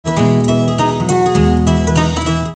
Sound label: Kora